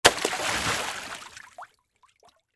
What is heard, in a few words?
Liquid Water Water Splash Hands Big Splash